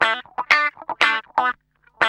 GUI, LICKS; 120 LICKS

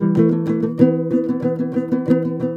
TOPSHUR; Soundscan vol.60; Asian Traditions; AKAI

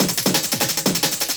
175, Breaks; Drum&Bass; EZ Rollers

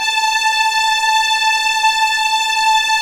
A5 LEG F, VIOLINS; East West; The Ultimate String Collection

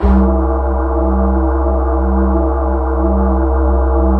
U220 CALLIO; Greytsounds; Scott Peers Sound Engineering

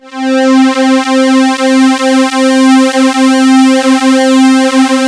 C5, STRING; Q Up Arts; Dream Experience

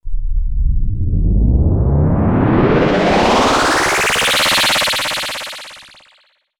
sweep, SCIFI; Techno Trance Essentials

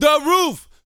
VOCAL, R&B Dance, HIP-HOP

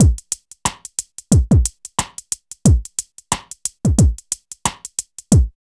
DRUM LP; East West - Phat & Phunky

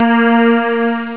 string down; Amiga Soundtracker Sample Packs

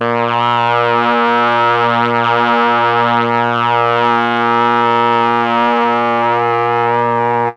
MEL BRASS; ILIO; Propeller Island; Legendary M400

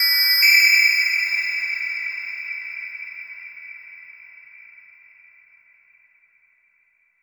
Long Decay, FX

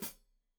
Hat Foot, Hi-Hat, Cymbals Stereo

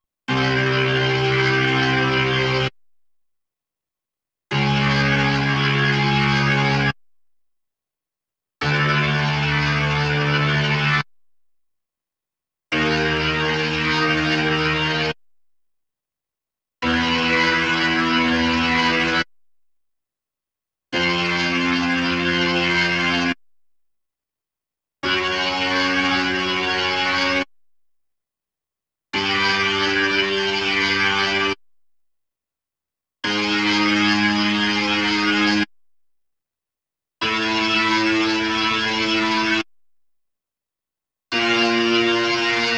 B3 Organ; Masterbits CLIMAX 8

